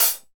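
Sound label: hi hat; HH Kits; Stereo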